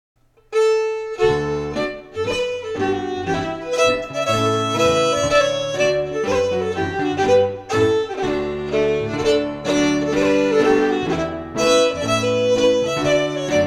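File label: Reel, Scottish Fiddling; Macglashan vol 1796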